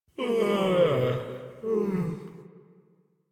Long Sigh; Warframe; Deimos; Father; Vocalizations; en

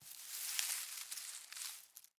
Forest Open Glade Grass Move Close; Ambience; Day

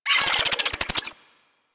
Infested Minion Pain; Warframe

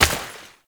Step Water

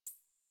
Computer Room Beep Misc; Warframe; Ambience; Nineteen Ninety Nine

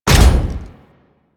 Jump Landing; Warframe; Corpus; Arachnoid Hunger; Steps

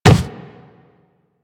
Operator Run Wood, Footsteps; Warframe

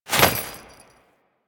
Jump, Foley; Warframe; Grineer Royal Jester

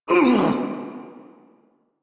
Pain Lich D; Kuva Lich

